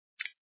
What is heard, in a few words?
click